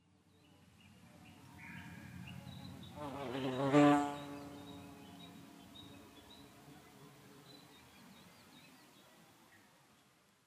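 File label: hati sp, bee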